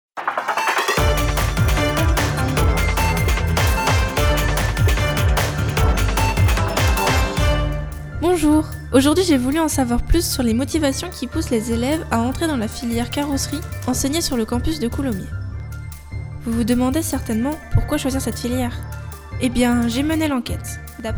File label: pad